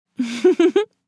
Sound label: Female chuckles